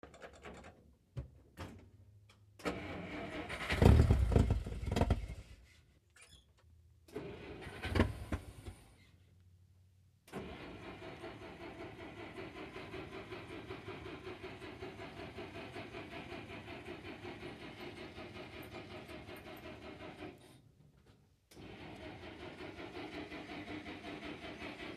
Dodge Power Wagon Fire Truck, Interior Pov, False Starts, Rough Starts, Various.